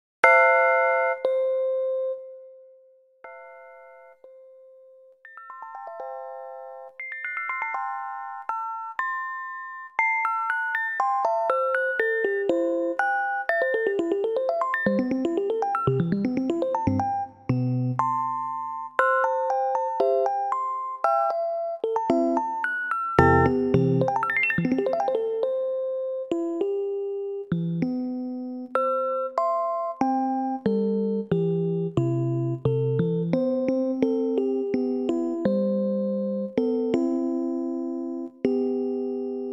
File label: twilight bells